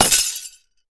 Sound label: ice spell impact shatter; Infernal Radiation